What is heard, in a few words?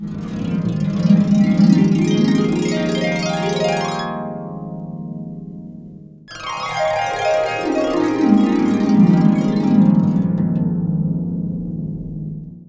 Harp; FutureMusic